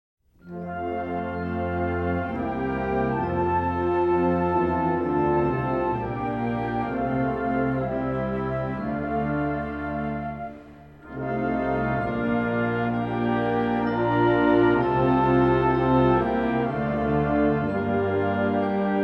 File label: concert f chorale